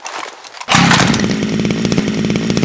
chainsaw reload; weapons

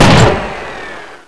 shoot

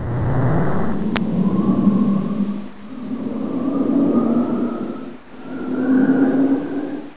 fan on

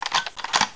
sniper bolt, weapons

